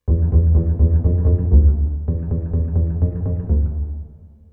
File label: pizzicato